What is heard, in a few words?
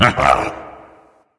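"heh heh", nonverbal; smite; voicepack; khepri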